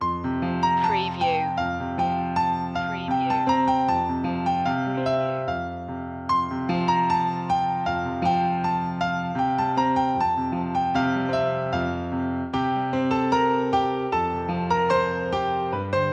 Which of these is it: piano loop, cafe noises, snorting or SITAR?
piano loop